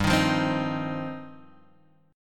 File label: G 7th Suspended 4th Sharp 5th G